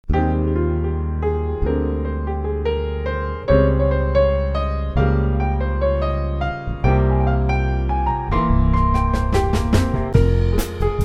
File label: Lullaby; Brahms